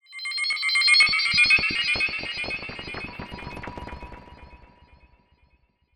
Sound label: sound design ascending beeps delayed